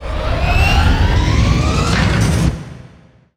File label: sfx sci fi; Masterbits - Switch FX